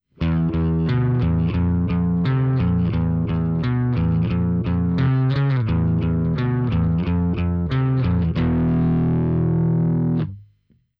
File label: bass drive satu; behringer vtone